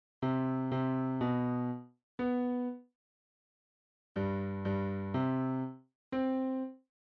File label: Rythm CELLOS II DB; Beethoven - 7e; MES 27-50